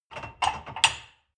dishwasher cup grab

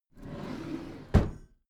packed drawer smooth close regular

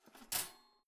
toaster soft eject

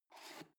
foot switch dimmer slide down

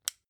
flashlight push in

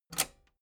metal button robust spring push disable

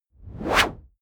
whoosh noise sweep hit long

